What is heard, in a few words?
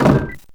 Door Ghost House